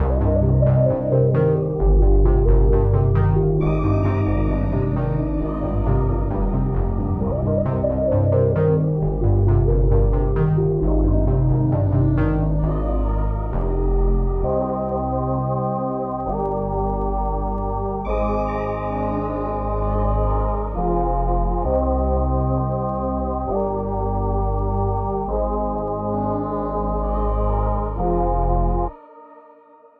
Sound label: 133bpm C minor